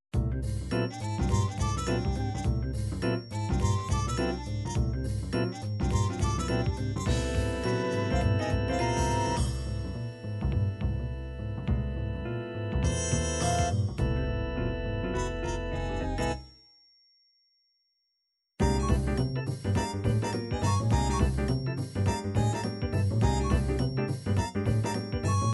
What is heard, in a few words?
acid jazz, midi